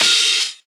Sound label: subtle reverb open high hat a sharp key